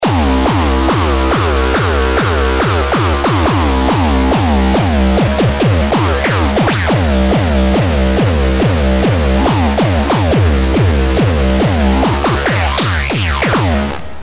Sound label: hc kick